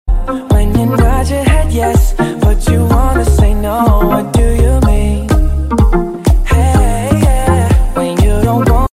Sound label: mix beat ringtone